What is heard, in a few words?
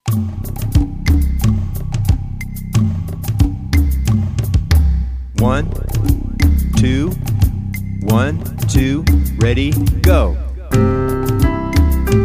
Piano Method - Tick Tock; Wagner Piano Method